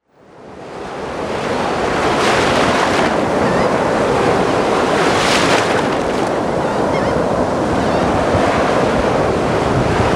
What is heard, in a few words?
Waves; Chimera